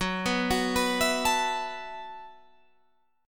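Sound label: B 7th Suspended 4th G flat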